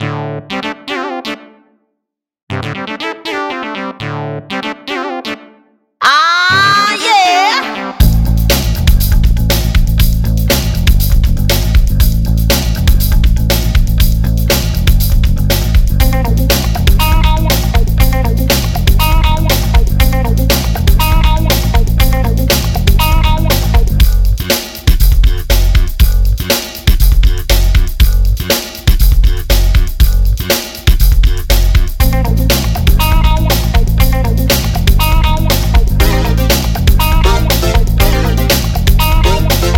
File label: funk rock